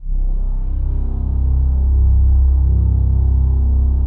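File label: PAD, atmospheric pads; Best Service; XX Large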